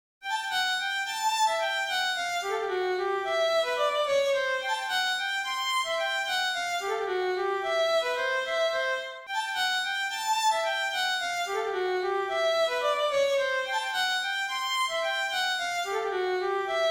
Mysliwska polka na skrzypce lub mandoline solo; B. Teszner

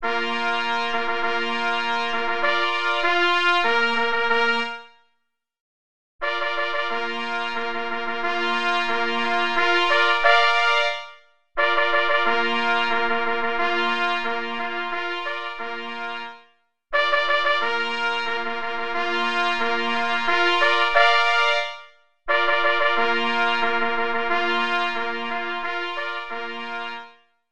Marsz na 3 plesy; J. Rentflejsz; Marsz jubileuszowy

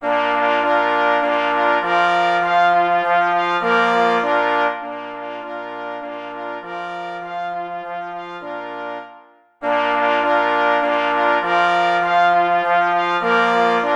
Marsz na 2 plesy i 2 parforsy; J. Rosner

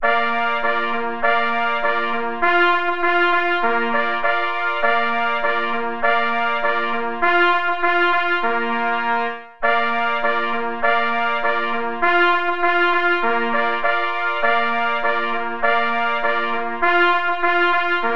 na 4 plesy; Posokowce gora

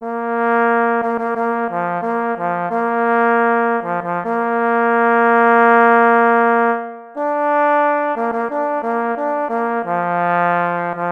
Fanfara, parfors solo; M. Lesniczak; Fanfara na czesc Diany Wschowskiej

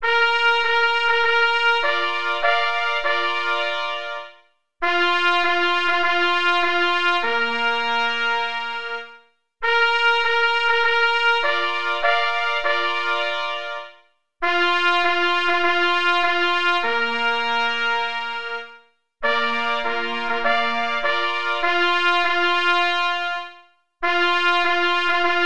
Marsz na 3 plesy; K.M. Kadlec; Marsz na wprowadzenie pocztow sztandarowych

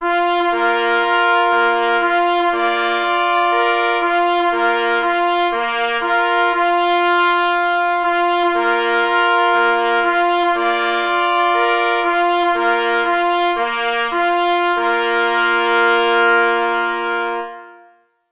Wiwat na 3 plesy; K. Kryk; Wiwat dla zwyciezcy

